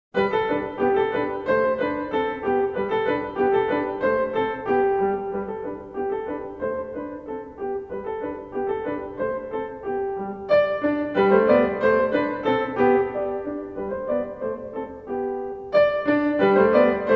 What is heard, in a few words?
Canary; Hove